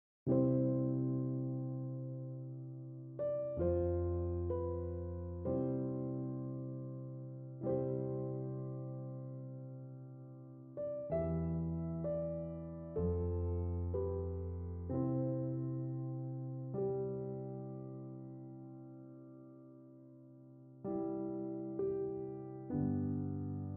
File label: Romantic